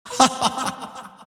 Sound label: crown laugh; dota; monkey king